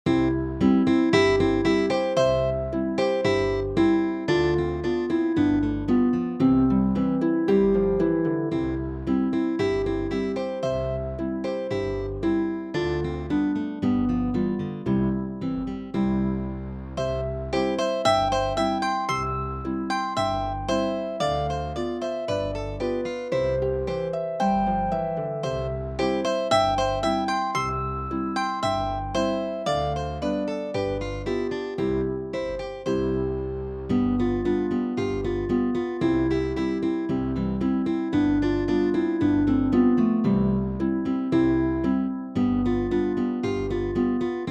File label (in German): Tief und Hoch Boarischer